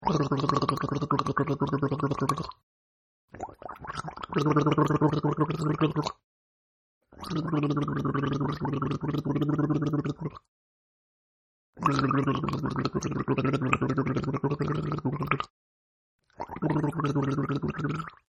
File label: Man Gurgling Water; Man Gurgling Water-5 Takes; Gargling; Voice